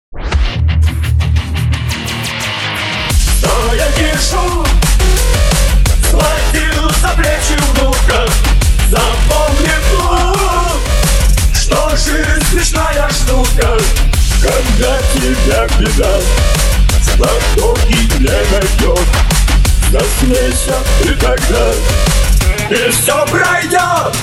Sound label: mix DNB